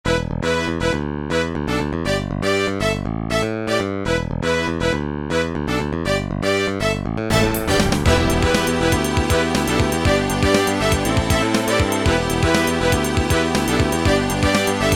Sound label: title & menu; phone; edge rally 2